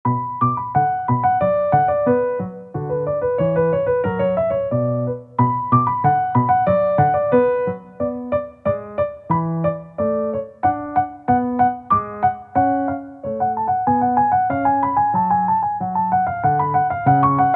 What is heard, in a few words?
Badinerie, piano; BWV 1067; Bach; piezas faciles piano